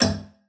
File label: robotic click; soundscrate